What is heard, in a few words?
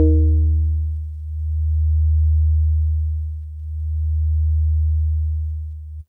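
Jazzy Organ DX100 D#5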